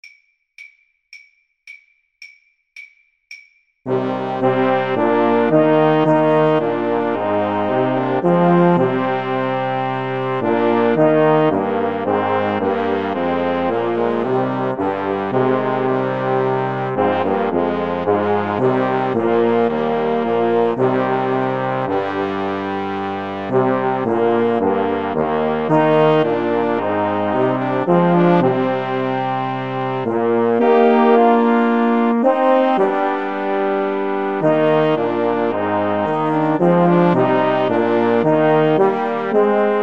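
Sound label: emmanuel saxophone karaoke, saxophone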